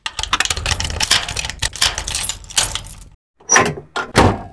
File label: unlock; Mafie 1